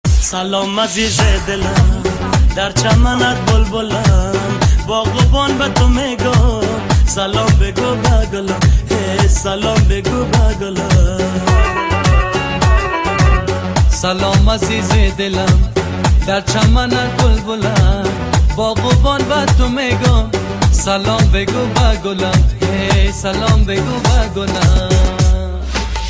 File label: Afghani Music